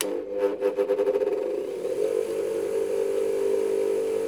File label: Grinder; INDUSTRIAL